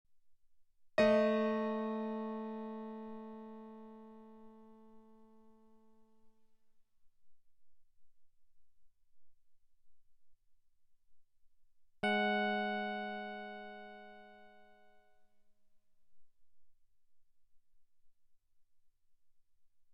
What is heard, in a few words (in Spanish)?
intervalos abiertos